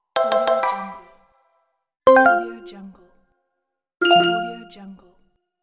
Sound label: notification